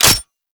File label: Exalted Sniper Mech; Warframe; Frumentarius